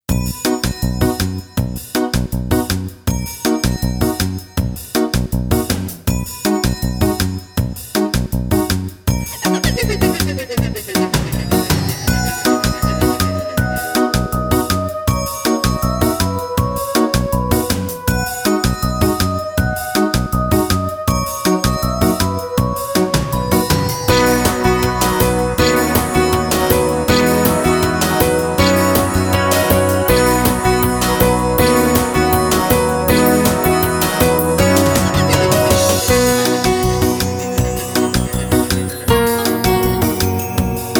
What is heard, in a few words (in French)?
VERSION KARAOKE